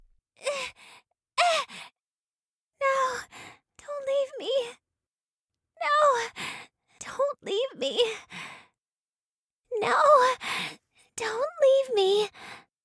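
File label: whimper, female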